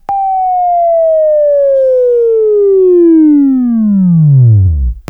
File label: Buzz, Sweeping Training